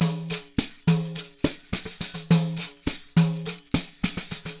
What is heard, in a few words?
percussion loops 104; Sampleheads; NYC Percussionworks; TI LOOPS 104